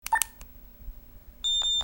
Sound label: beep